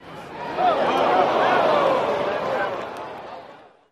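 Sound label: Crowd Cheer Ooohh Outdoor, baseball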